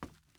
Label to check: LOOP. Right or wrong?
Wrong